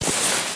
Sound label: spin Start; wolfcub